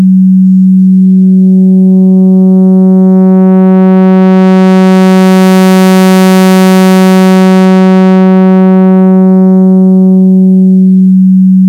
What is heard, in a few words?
sinus NL, Trompette; Modeles Physiques